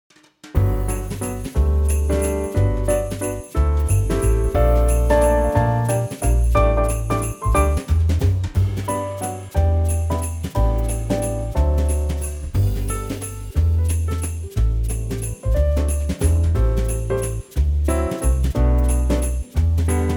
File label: G trio